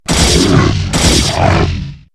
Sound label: Hit